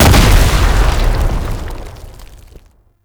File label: tank infested swipe impact; Enemies; Abilities; Lasrian Tank; Warframe